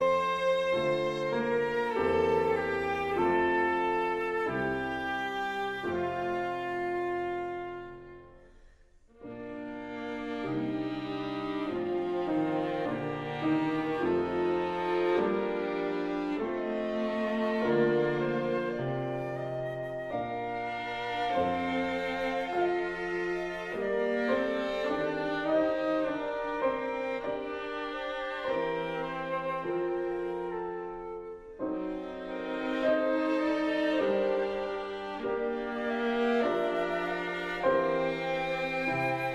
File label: for flute violin viola and piano choral; suite dans le style ancien